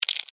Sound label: Insect Eat Bite, Bug; Animal; Insects Eating; BlastWave